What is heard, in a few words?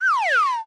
shrink